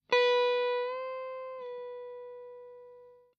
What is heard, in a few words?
bend example, guitar